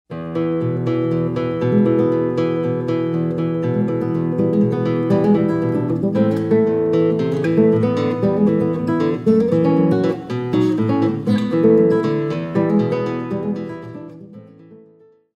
Country-time Guitar; Soundzabound Volume 4 Disc 2; country Song

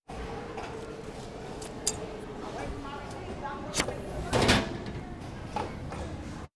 drum sound; manodrum; city centered

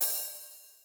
General Room Open Hihat; drum samples

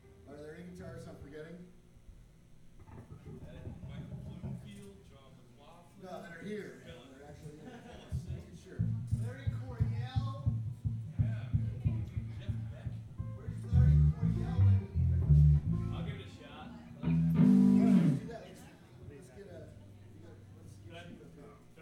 guitar switch

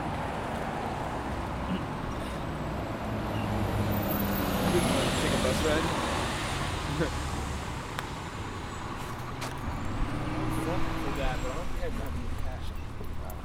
landscape; Listen01 Sample Pack

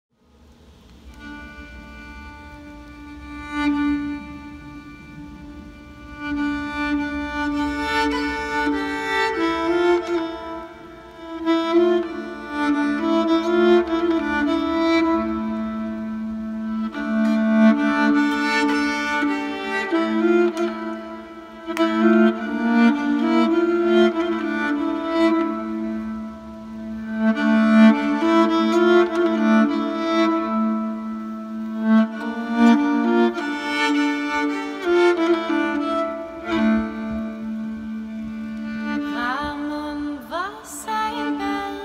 Danish folk song on Nyckelharpa

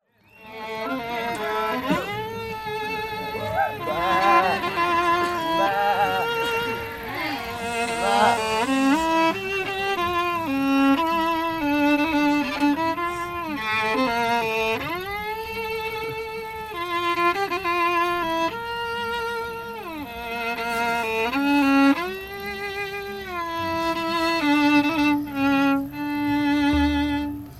violin

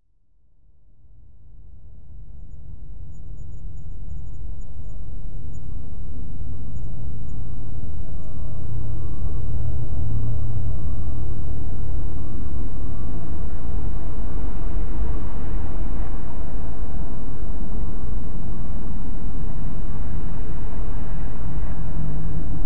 Quiet Scary Piano Noise, Low Wet Panning; 24 mins

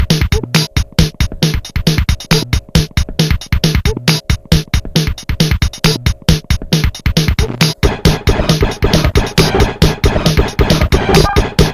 Casio SK200 bend; Misuser

